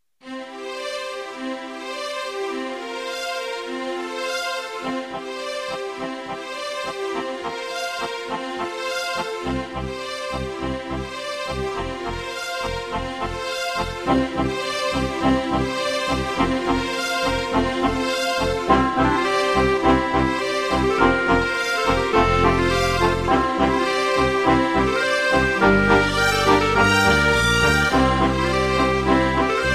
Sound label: Adventure Rides the Foamy Waves